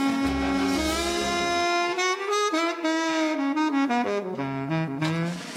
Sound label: solo